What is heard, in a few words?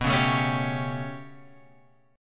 B 7th Suspended 2nd Sharp 5th B down Guitar Open F; B7sus2#5 strummed; chord shape; JGuitar